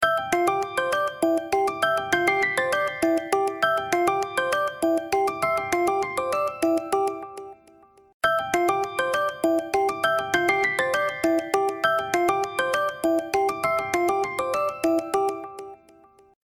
Melody Alarm, alarms; LG G Pad III 8.0 FHD

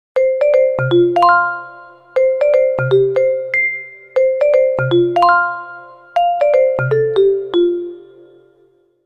Stuttering, ringtones; Sony Ericsson Z555i

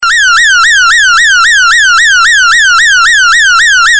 siren; Philips; Multimedia Studio

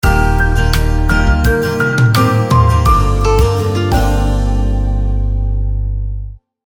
Autumn Falling Leaves; Sony Ericsson; PlusFourSix

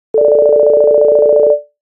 Low, Nokia Tones; Windows Phone apps

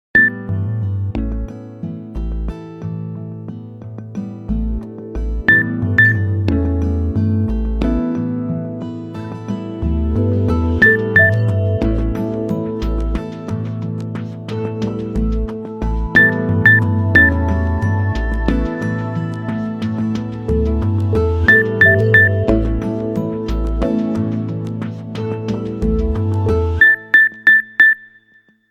Clock alert, Nokia phone tones; 500

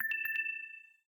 Low Battery; LG; G2-D802

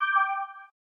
NFC End, phonetones; LG V60 ThinQ

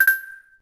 Timer End; HTC Freestyle

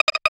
Charging Reverse; Nothing Phone (2)

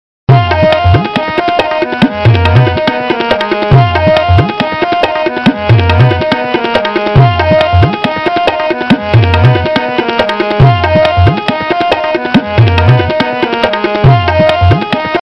Tabla Loop; Retro Ringtones

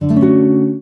Classic Guitar; Realme 8

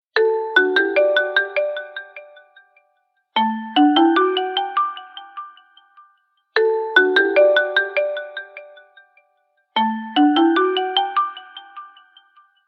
Tune Clean, ringtones; Huawei P30

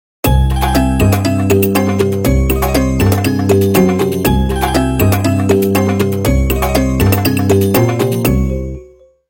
Belly dance, Ringtones; Nokia; 6500 slide

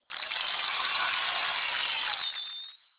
Clapping; Audiovox CDM-9900

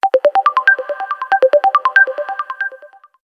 Tune Synth, ringtones; Meizu MX2; Flyme

